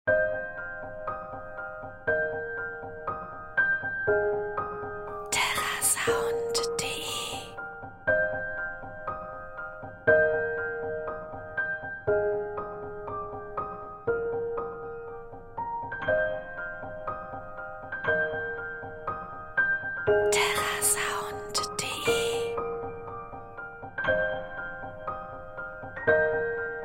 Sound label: sensual piano; terrasound